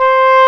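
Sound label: woodwind C1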